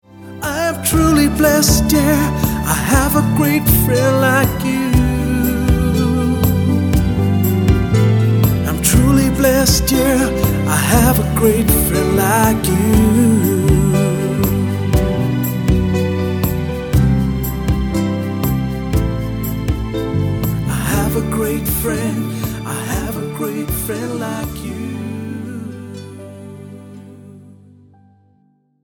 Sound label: THANK YOU ENGLISH MALE SONG